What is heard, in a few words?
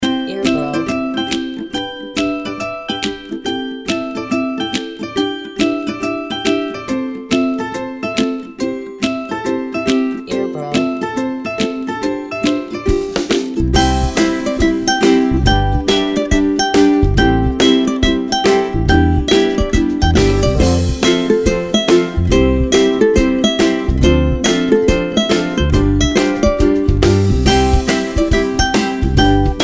Hello Ukulele